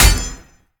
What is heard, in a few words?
Impact; smite; god susano